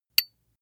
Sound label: small metal impact medium high